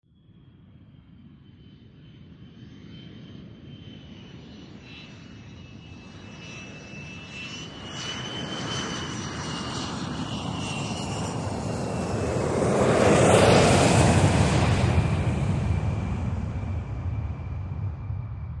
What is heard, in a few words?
Jumbo Jet Fly Over, jets